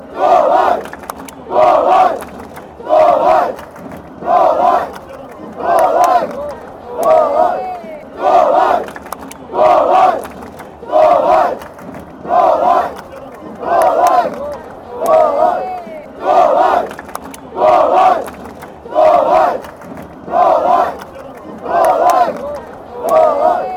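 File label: sao paulo chant, football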